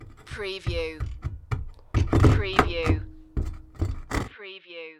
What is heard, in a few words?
Metal Sw Clnk Wd